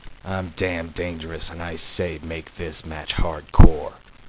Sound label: hardcore; deathmatch